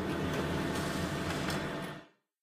kopierer; minecraft

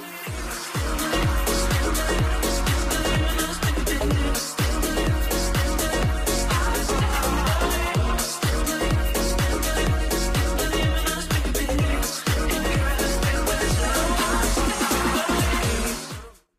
disko; minecraft

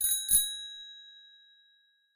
hupe; minecraft

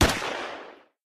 waffen schuss; minecraft

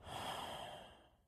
shisha ausatmen; minecraft

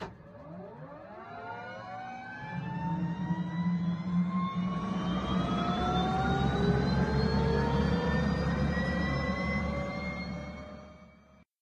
helikopter start; minecraft

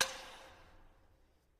fitness hantel; minecraft

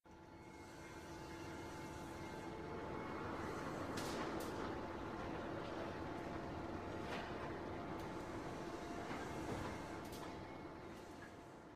hafenarbeit; minecraft

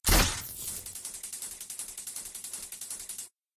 waffen taser; minecraft

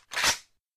waffen laden; minecraft

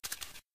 waffen zielfernrohr; minecraft

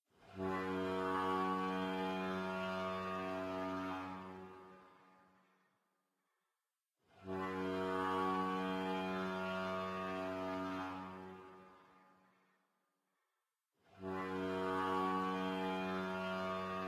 schiffshorn; minecraft

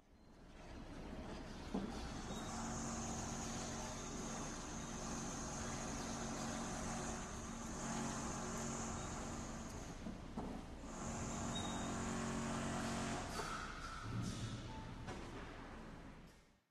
baustelle; minecraft

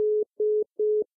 telefon besetzt; minecraft

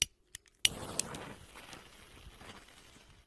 chinaboeller; minecraft

